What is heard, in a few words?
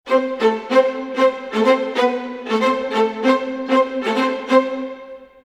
95 strings theme mid, Orchestral arrangements, 95 A min